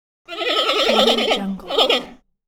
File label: panda bear laughs